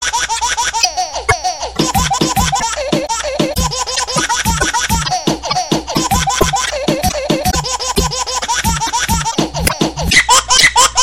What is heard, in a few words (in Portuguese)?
risadinha de bebe